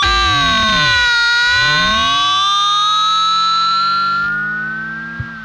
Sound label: whammy fx, guitar